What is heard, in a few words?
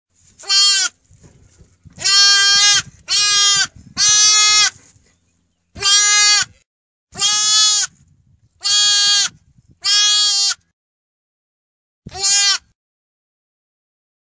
Orphan Lamb